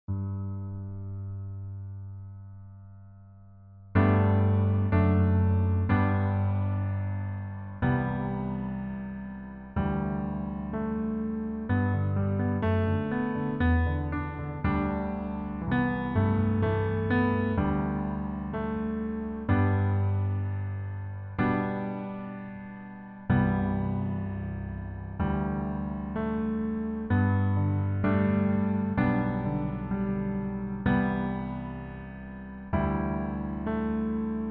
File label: Piano